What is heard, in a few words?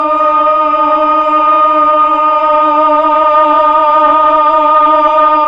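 voice Mellotron choir, slow choir; Roland LCDP09 Keys of the 60s and 70s 1; Melotron Vox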